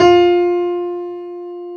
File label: GRAND PF; B-GRAND PF